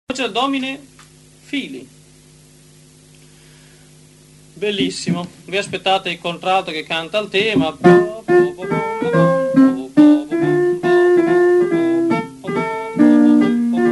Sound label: Domine Fili Unigenite Tenori; Vivaldi Gloria